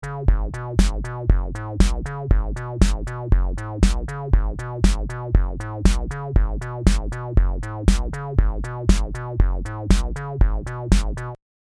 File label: groovebox DI in; Black Lion Audio Auteur